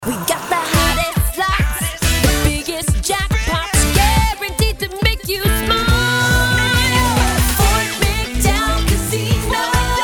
Casino, casino jingles; Ft McDowell

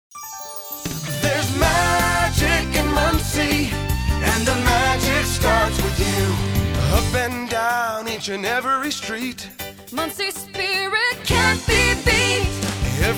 jingles, community jingles; Muncie Indiana

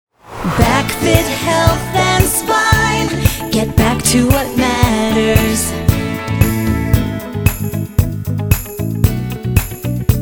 Donut, chiropractic jingles; BackFit Health Spine

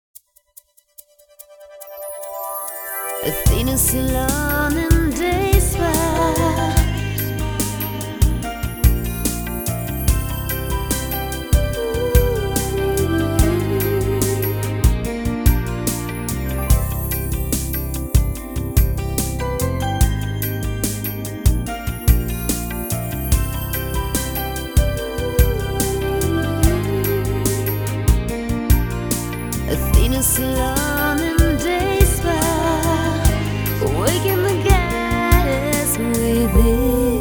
spa jingles; Athena Salon Spa

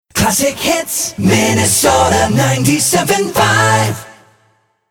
Acapella; MNpella; tv station jingles

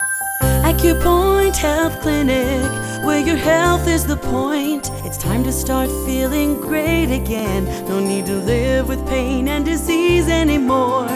hospital jingles; Acupoint Health

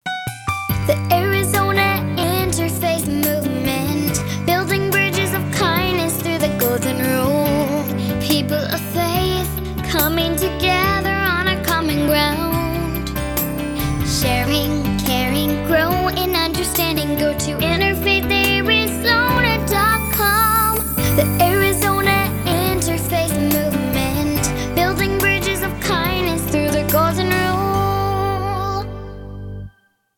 Full Sing, community jingles; AZInterfaith

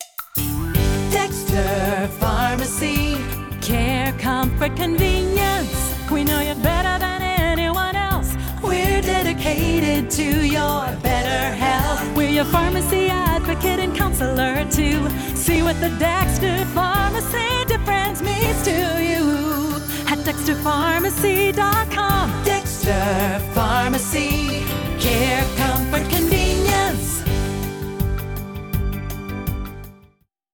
Pharmacy Full Sing, pharmacy jingles; Dexter Pharmacy

